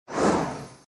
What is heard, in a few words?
wheel in